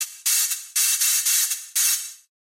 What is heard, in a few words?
hihat